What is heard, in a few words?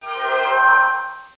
block; MAGIC; DuelSounds